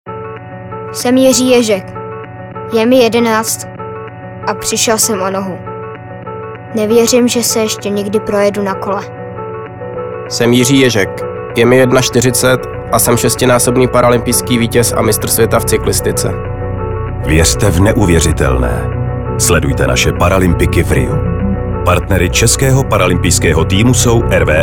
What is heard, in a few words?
radiomix; PARALYMPIADA